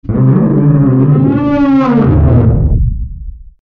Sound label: moaning elements